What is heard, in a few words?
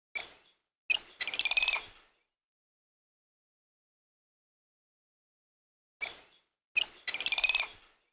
ambi, jungle; gearbox